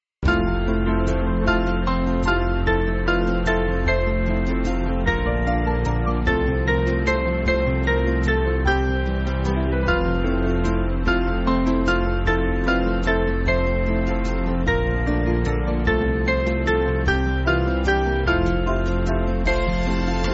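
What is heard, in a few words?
Band, Backing Files for Churches; Contrast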